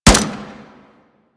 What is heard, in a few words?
Fire Mech; Warframe; DE; Weapons; Burston